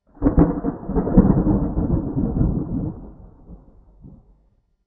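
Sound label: storm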